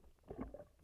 bufo jump; soniferous; Gilligans Island

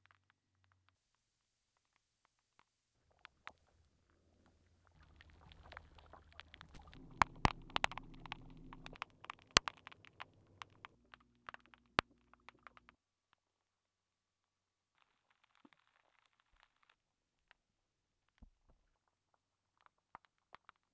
composite noise samples; Gilligans Island

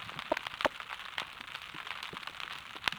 chicken; soniferous; Gilligans Island

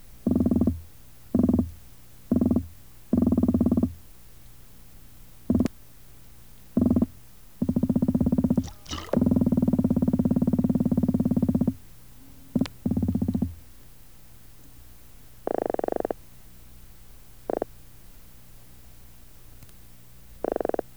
micropogon undulatus; Atlantic croaker; Recorded in Maryland and Virginia during 1962

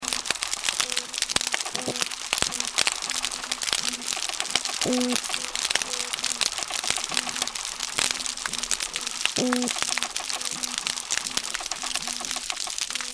Florida soundscape

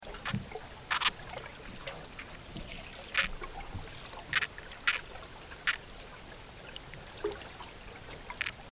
Nemadorus elongatus; Amazon Fishes

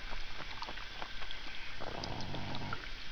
grunts groan, soniferous river project sounds

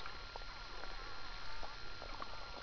rapidclicks birdlike; realing

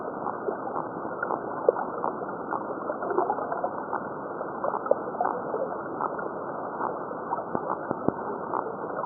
Ageneiosus atronasus, Amazon, soniferous; Amazon Fishes

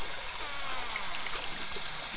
pier, river project sounds; soniferous; piersounds

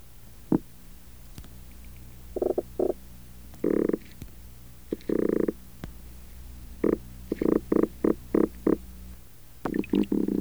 pogonias cromis; Black drum; Two females recorded in North Carolina

